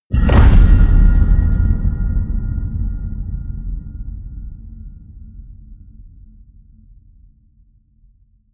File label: metal crash hollow ring out medium